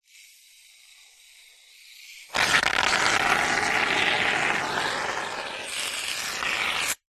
household shaving foam squirt

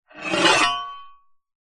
sword slow scrape on metal or other sword

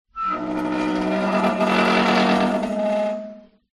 household metal squeak groan gate open; zapsplat

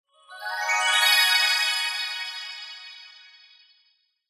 fantasy magic glissando 90s style dreamy ascend

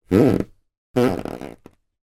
foley floor creaky through carpet

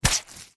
foley wet cloth dab squelch soft single; zapsplat

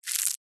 insect caterpillar bite eat leaf; animals

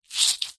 paper wet damp squeeze squelch; foley

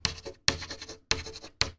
office chalk writing on blackboard

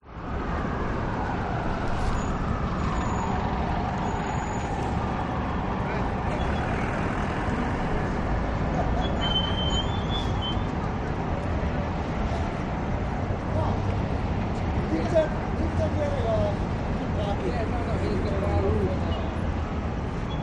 New York Traffic; audio hero

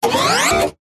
science fiction robot large mechanical movement

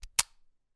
gun bersa; still north media

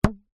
household hairband ping

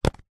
wallet leather set down on hard surface; foley; zapsplat

